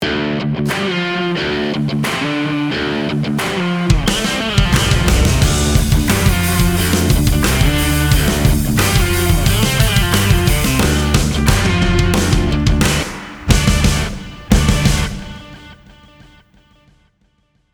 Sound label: Jingle; Ventrac